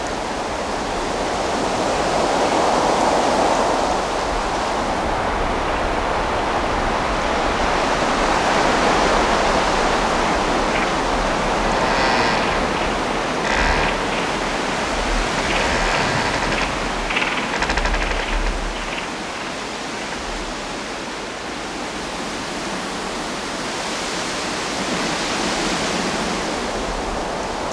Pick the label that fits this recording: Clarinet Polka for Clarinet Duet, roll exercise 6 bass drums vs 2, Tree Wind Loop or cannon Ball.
Tree Wind Loop